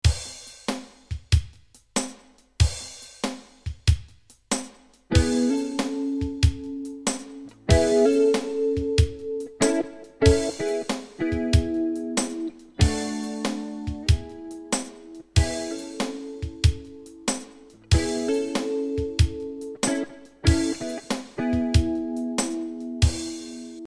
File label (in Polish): behringer z elektryczna; audacity podcaststudio